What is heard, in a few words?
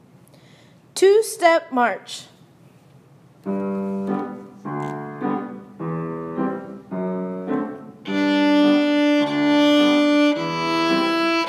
Two Step March with Piano